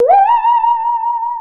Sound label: saw danger, music, zombat